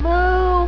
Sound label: moo; hall of moos